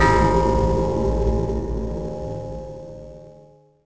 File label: bell; The Elder Scrolls III Morrowind GOTY; XBOX